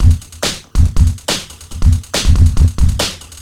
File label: Breakbeat; Vengeance Samples; VEC2 Loops